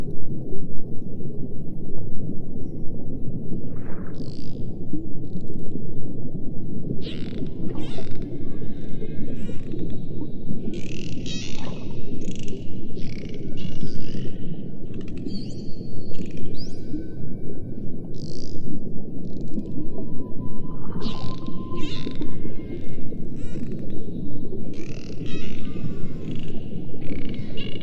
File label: UNDERWATER EXPERIENCE